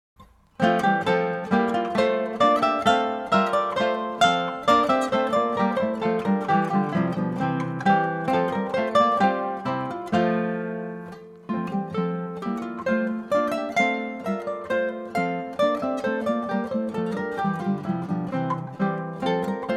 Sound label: bagpipes